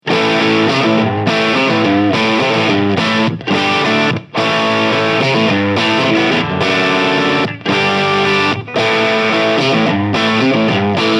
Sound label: Soundcity Master One Hundred Both Channel Crunch